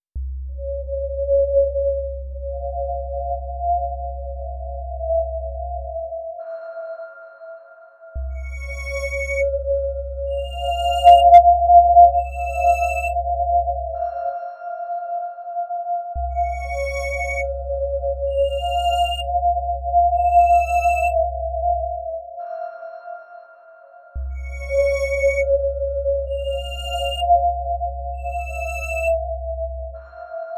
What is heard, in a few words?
horror movie music